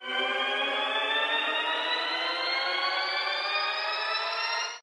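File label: respin sound; longpao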